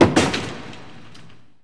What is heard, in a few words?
ZERO PIN, BOWLING